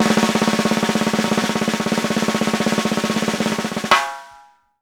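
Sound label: ROLL ACCEN, chrome Tama; Sampleheads; New York City Drumworks VOL-1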